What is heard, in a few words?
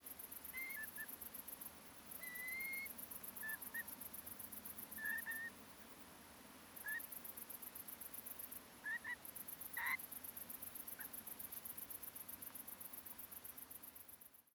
Whimbrel screechy calls with Tree Pipit whistles; Portland